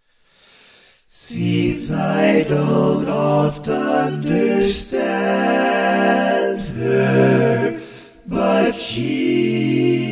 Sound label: barbershop tags, all parts